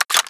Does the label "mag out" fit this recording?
Yes